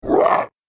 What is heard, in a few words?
Monster Nasty Monstie; horror